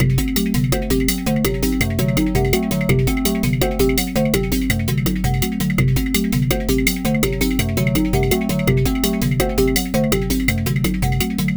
Sine Wave, Processed Percussion

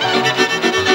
VIOLA GROOVE; Best Service; World Colours

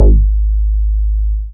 BAS MINI, Mini-Moog

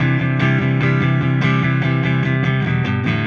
Silos Clean Strum Guitar, Indie Rock

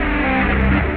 Fat Loop, Electric Guitar; Sonic Foundry (Sony Creative Software) - Friendly Fire

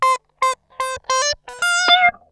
SQUEEZER, Funk Guitar; 90 s Sample CDs; Zero G

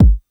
BD Club Classique, Bass Drum; Lost Tapes From Mars

